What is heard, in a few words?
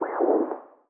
Player UI, sfx; Five Nights At Akers; soma